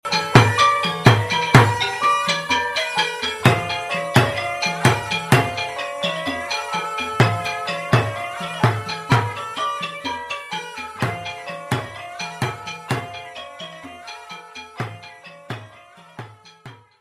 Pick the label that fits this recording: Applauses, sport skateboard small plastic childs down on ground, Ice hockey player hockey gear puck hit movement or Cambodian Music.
Cambodian Music